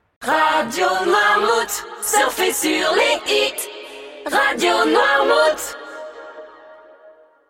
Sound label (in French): Jingle Chante Radio Dance accap; Radio NOIRMOUT